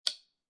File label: Bag Buckle Snapped Close